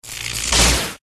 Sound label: Light Spirit